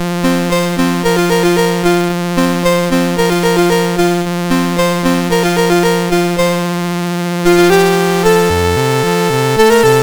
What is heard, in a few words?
Old Toy Trains, MOS6581R4; Vaxman1